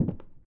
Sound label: Out Side Foot Step